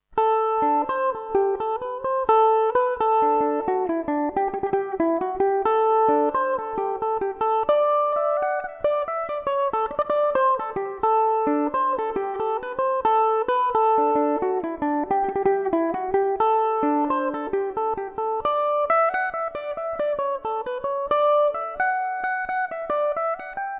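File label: D mixolydian revelation, Irish mandolin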